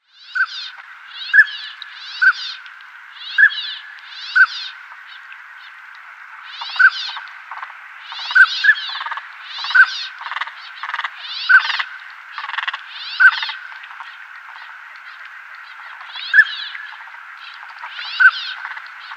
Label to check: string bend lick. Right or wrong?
Wrong